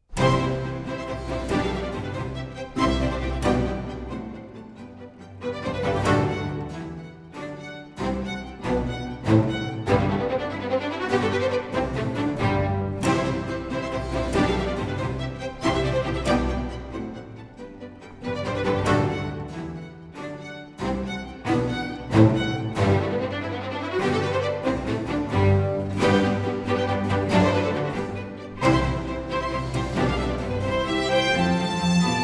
Sinfonia (Concerto in Do maggiore per archi e cembalo RV116) - Allegro; Antonio Vivaldi (1678 - 1741); Vivaldi - Orlando furioso (2004)

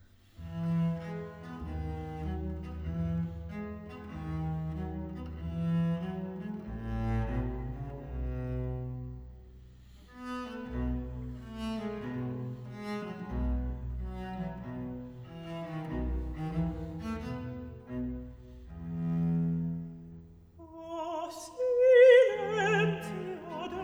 Arioso; Atto 1 - Scena 8; Vitellia; O silenzio del mio labbro; Antonio Vivaldi; Tito Manlio (2005)

